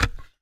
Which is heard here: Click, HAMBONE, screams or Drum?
Click